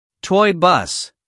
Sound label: toy bus